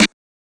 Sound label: 00s treble heavy snare drum sound a key; Duration 0:01; Samplerate 44100 Hz